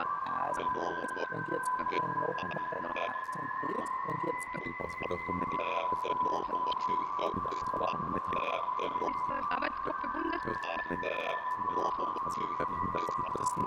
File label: comprehensible, sonifikation; sound of science